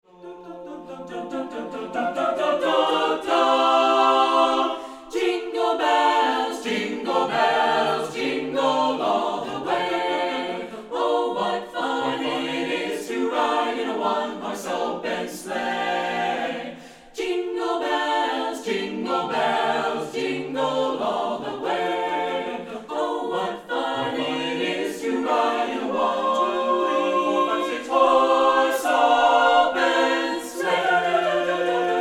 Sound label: jingle